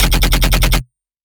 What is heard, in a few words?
Bass F; Dubstep; Bass One Shots; Charger1; Ghosthack; Dubstep Sound Arsenal; 50 Bass One Shots